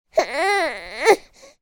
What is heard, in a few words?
HUMAN BABY